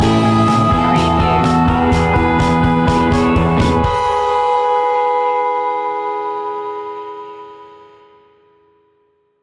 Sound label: rock with us stinger